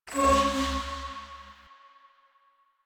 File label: Choir Chorus Aura Life; Warframe